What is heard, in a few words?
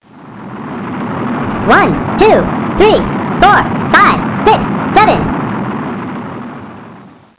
Bug mixed; How Many Bugs in a Box